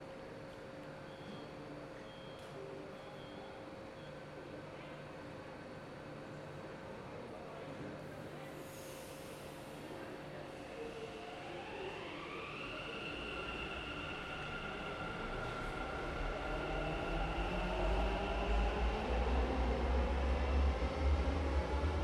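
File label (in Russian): внутри поезда метро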